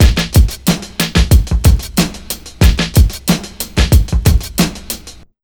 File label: 92 BEAT, Pure Electro, BEAT MIX; USB Soundscan vol.03; AKAI